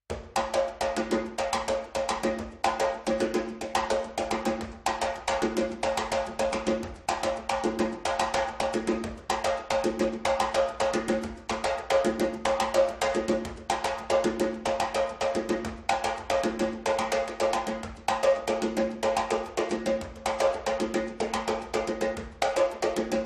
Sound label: timbal